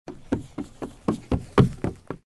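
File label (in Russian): бег и крики детей; beg i kriki detey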